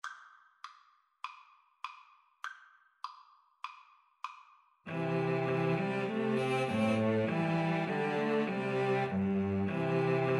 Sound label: jasmine flower, String trio